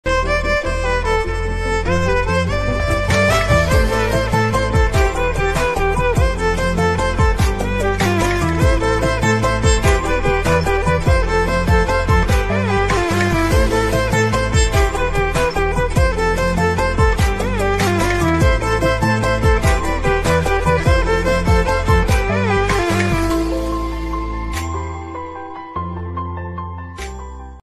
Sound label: theme ringtone mobile ringtone new love ringtone